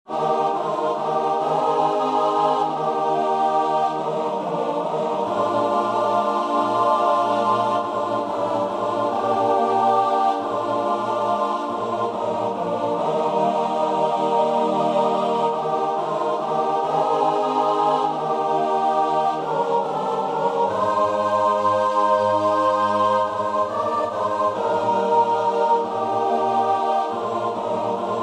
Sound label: CHOIR